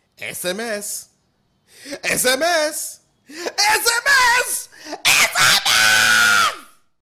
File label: Sms